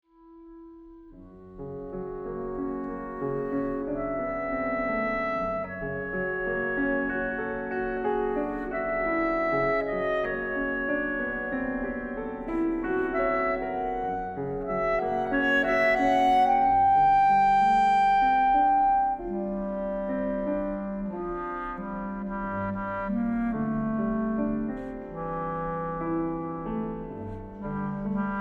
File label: Clarinet Sonata Calmly; Higdon